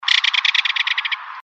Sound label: Belted Kingfisher